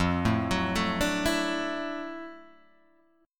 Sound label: D Major Add 9th F Guitar Standard, chord; arpeggiated; JGuitar